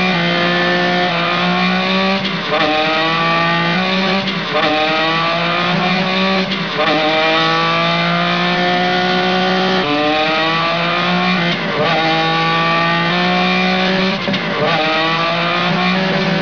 racing

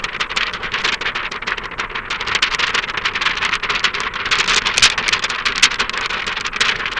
shaking paper

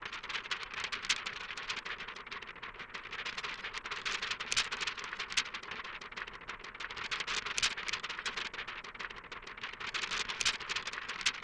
Shaking Paper; SynTex

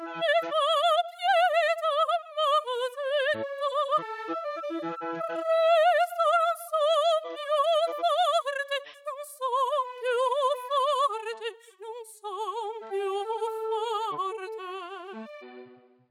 opera fem; Wei-Hsiang Liao

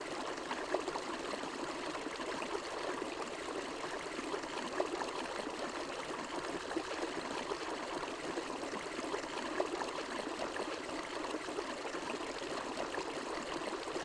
Stream Waterfall; SynTex

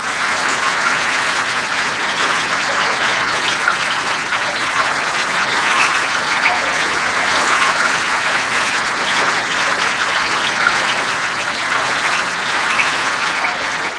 Heavy rain falling and dripping; Wei-Hsiang Liao

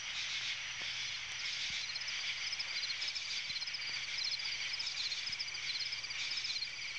Insects In A Swamp

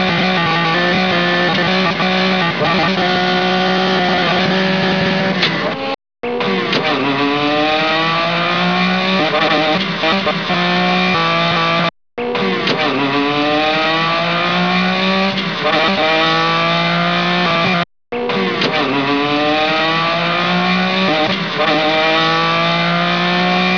racing; Dubnov

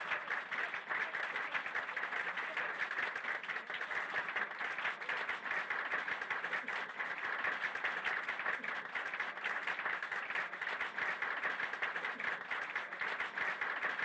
Enthusiastic Applause; SynTex

